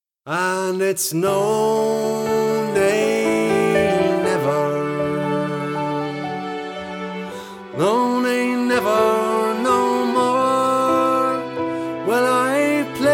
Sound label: irish celtic wild rover